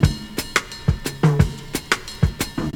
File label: BOSSA, loop 89 BPM; 0- 99 BPM